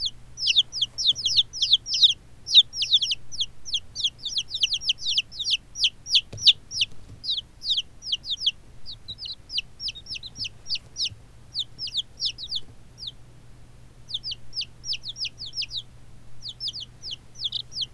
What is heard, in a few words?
chicks